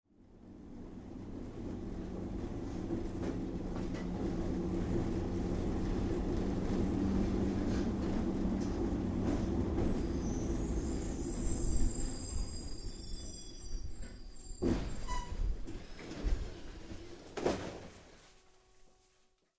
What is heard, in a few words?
stop, villamosok zörejfelvétele